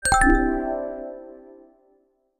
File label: Additional Alert; Lumia Ringtones